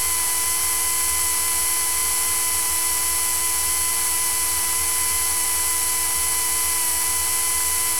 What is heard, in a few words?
motor